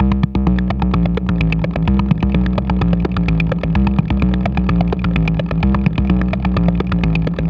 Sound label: Dirty Groove; Extra Tweak Grooves